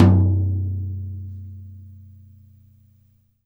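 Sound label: JAZZ KIT TOM BASS, Drums Acoustic; AKAI; 90s sample CD; USB Soundscan vol.10; JAZZKIT 3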